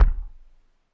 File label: Office Chair, Found Percussion Hits; UNUSUAL KITS and FX; ModeAudio